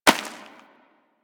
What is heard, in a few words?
Rodent Hop Step Rear Dirt, Forest Rodent Foley Footsteps; Characters; Warframe